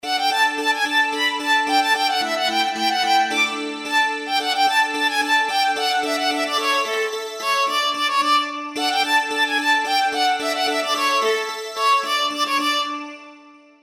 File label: Hopak, Cherkashchyna